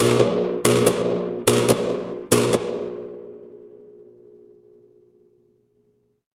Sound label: fire electric arc